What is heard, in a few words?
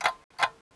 clock; Mafie 1